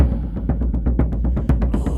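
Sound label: Music Shield; From Dust; ps3; xbox 360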